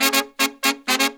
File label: Pop & Funk Brass; Best Service ProSamples vol.25; AKAI